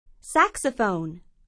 saxophone

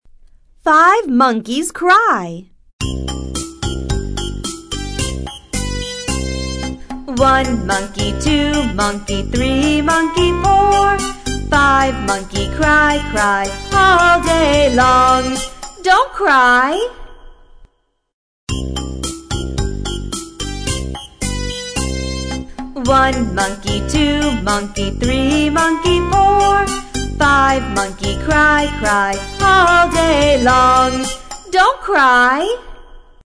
Five Little Monkeys Cry

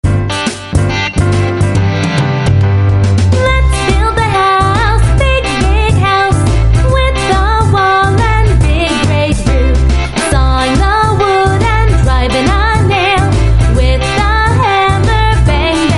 We Saw The Wood

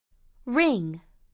ring

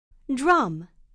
drum

